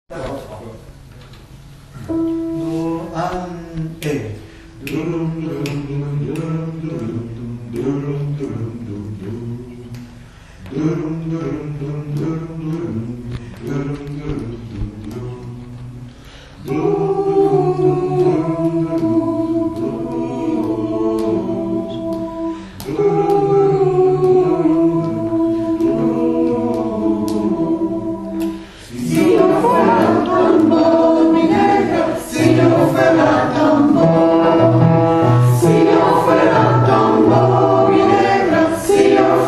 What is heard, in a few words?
BULLERENGUE